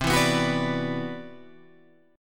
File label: C-Major Sharp 11th, Guitar Open G